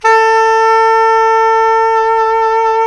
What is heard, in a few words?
SAX SOP MF, Soprano Long